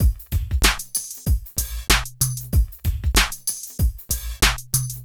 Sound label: Smooth, R&B Drums